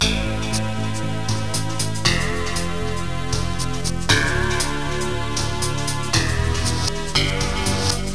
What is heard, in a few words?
Battle Music; AmoebArena